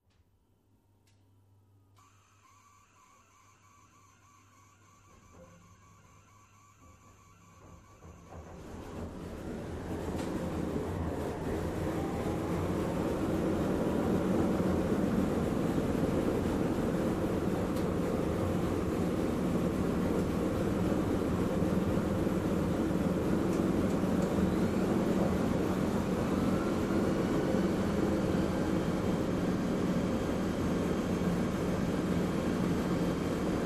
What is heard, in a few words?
Airplane, Internal Sounds W Pneumatic Whines.